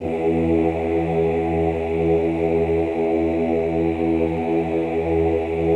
OOH E1, Classical Choir; Best Service; Extended Classical Choir

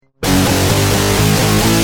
Guitar, Industrial

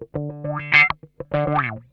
OUCH, Funk Guitar; Zero G - Funk Guitar

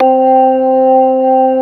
C4, Keyboards Old School; USB Soundscan vol.09; AKAI; SMALL B3

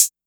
Closed Hat, TR-808; Roland MV8800; TR-808mk2

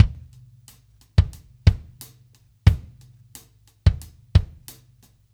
Ballad Loop, drum; Laid Back; 70's Ballad; Sonic Foundry (Sony Creative Software); Loops & Samples Drum Tools

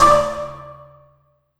PERC, Drum & Bass, 170 BPM

